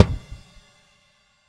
Long Crash; Airwindows

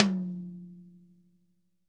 TOM, GM drum set; AKAI S-Series CD-ROM Sound Library VOL-8

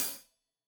Hat Loose, Hi-Hat, Cymbals Stereo

